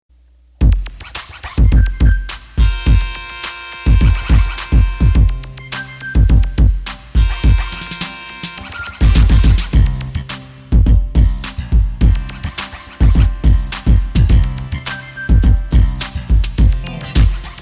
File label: Rap